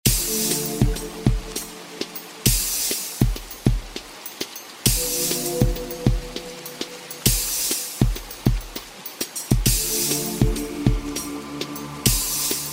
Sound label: beat